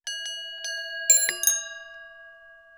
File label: ding ring